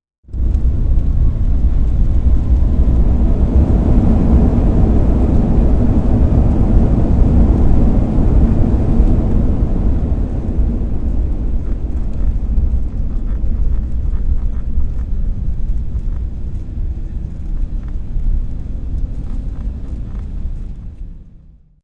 High quality stereo recording of an airliner cabin after landing.; Plane Cabin after Landing; Airport Sound Effects